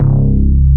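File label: BASS GLIS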